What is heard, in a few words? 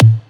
Kick; Ableton Live 12 Lite; Core Library; Drums